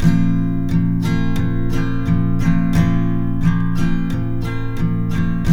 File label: MIN B, Guitars; Spectrasonics; Hans Zimmer Guitars VOL-1